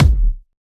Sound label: Kick